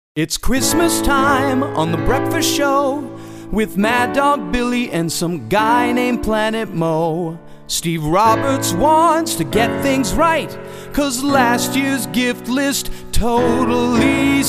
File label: Breakfast Show Jingle